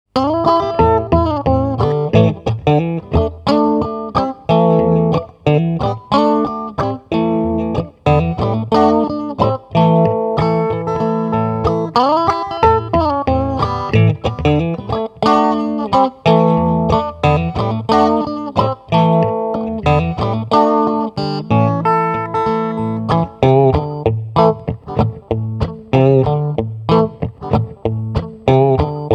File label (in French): Clair overdrive; Clair Tone14 Tone10 overdrive14